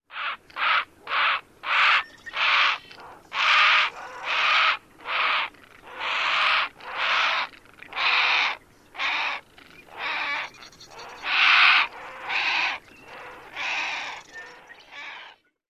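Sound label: Great Blue Heron persistent calling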